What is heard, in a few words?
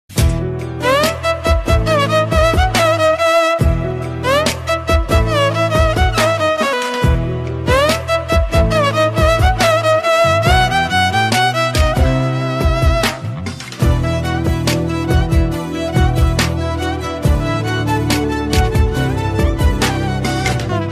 instrumental ringtone flute ringtone; Geeta Govindam